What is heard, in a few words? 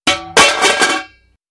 Hubcap Manhole Cov, crashes